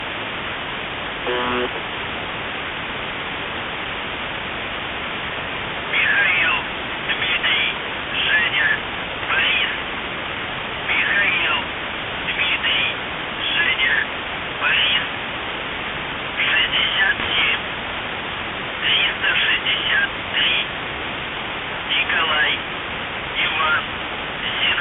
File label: buzzer